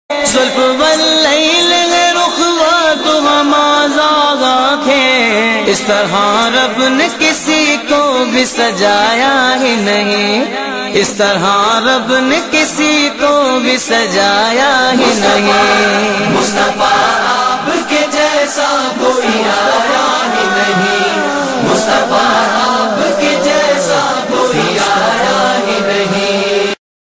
Naat Ringtone

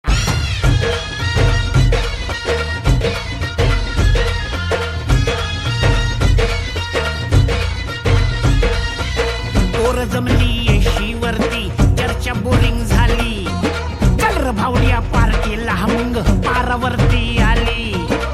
Movie Song Ringtone

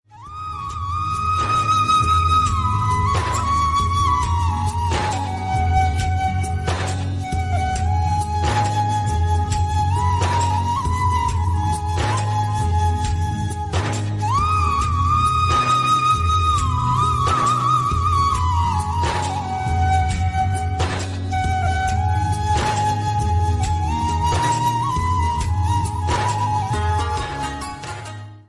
Ya Nabi Salam Alaika Flute Ringtone; ringtones

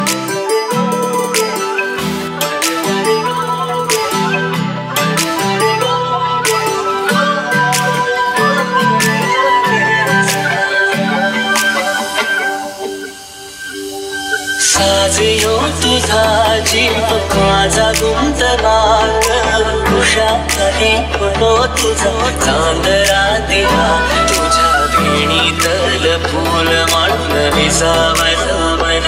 Movie Song Ringtone

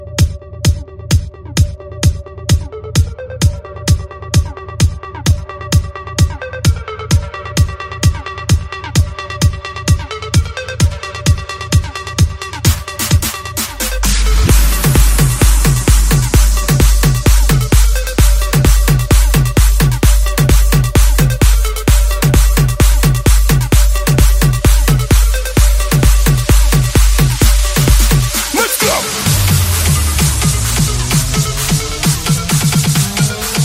Non Stop Music Ringtone for Mobile Phone; ringtones

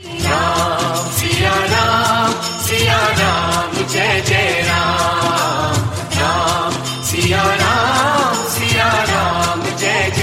Bhakti Ringtone

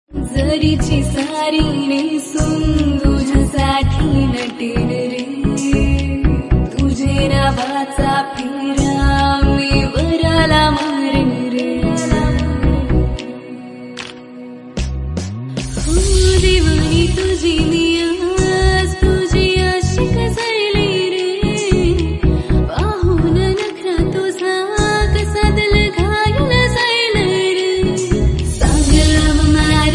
Female Version Ringtone

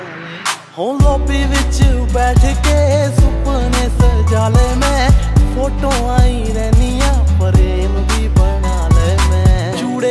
Punjabi Song Ringtone

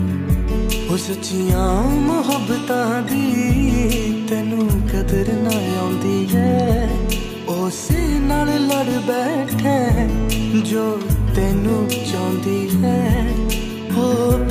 Punjabi Song Ringtone

